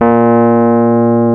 PNO, Rhodes Mk.V; Keyboards of The 60's and 70's

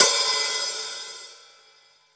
open, Drums Percussion; Zero-G; Total Drum Bass

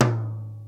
TOM; DRY KITS; Crypton; DrumBase5000

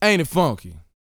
VOCAL; Spectrasonics Vocal Planet

